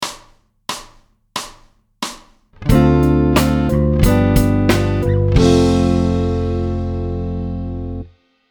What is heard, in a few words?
chord